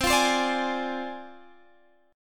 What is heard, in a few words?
G sharp 6th Flat 5th C